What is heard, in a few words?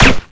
pl gun